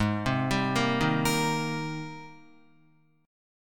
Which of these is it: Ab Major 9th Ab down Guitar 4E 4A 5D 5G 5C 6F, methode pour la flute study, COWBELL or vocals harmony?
Ab Major 9th Ab down Guitar 4E 4A 5D 5G 5C 6F